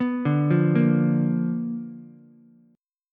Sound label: B Minor Major 7th Flat 5th Ukulele; arpeggiated; JGuitar